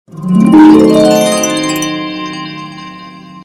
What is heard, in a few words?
magic sound